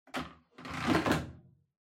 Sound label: Washer Front Load Filter Upper Insert